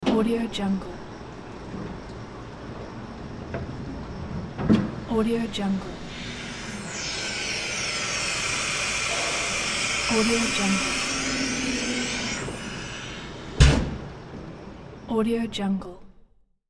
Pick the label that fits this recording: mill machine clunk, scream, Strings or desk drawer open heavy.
mill machine clunk